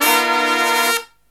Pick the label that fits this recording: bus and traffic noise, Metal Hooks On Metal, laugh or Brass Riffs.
Brass Riffs